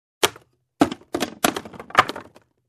Wooden sticks falling on table

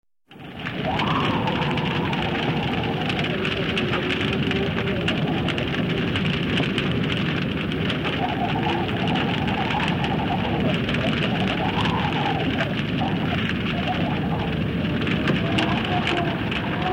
Rainy and windy storm ambience sounds lo-fi

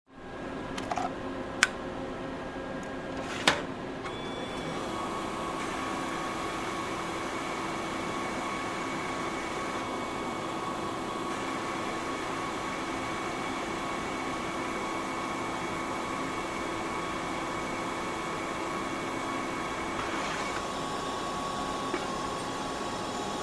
Copier

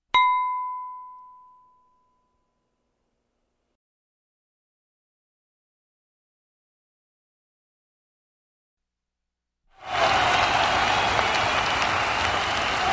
Crowd Applauding and Cheering

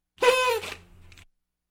Party blower sound effect

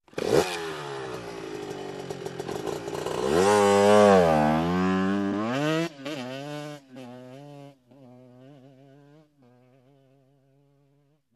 Motorcycle driving away

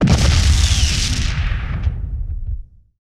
Rocky area explosion

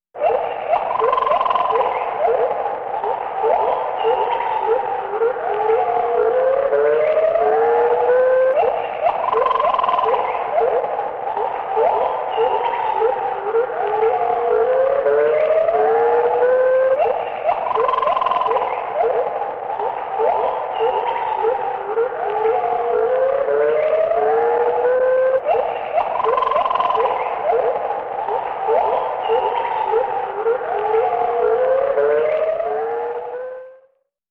Nocturnal Birds in a cave hooting and chirping

